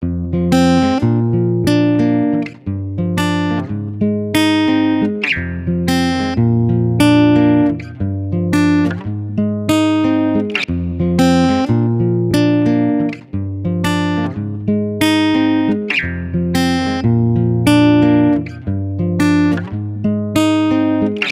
Guitar 90 BPM (Fmaj); Guitar Loops; Fever; Ghosthack; Guitar Loops & Shots

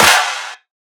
Clap, Dubstep

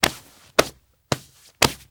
Ball Catch; Fairlight Sound Lib

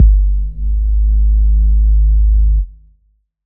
Short Bass Hit

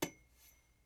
Hand to Metal or Brass; Fairlight Sound Lib

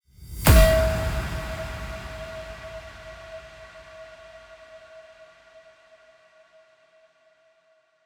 Resonance Impact - E; House; FX; Impacts

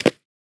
Short foley

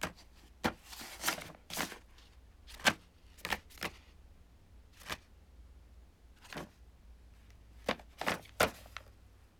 Passport Movement; Fairlight Sound Lib

